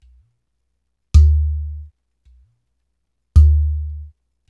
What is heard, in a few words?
108 BrkDrm Regge; Spectrasonics - Supreme Beats - World Dance